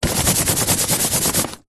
foley sheet of paper on cardboard box rub with hand